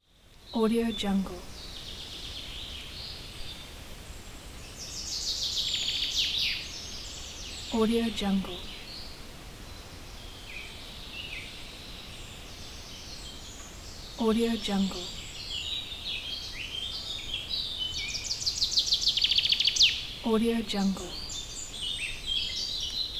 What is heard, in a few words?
mountain forest ambiance